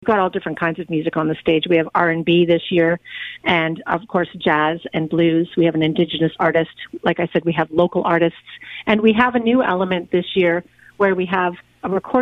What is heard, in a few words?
south coast jazz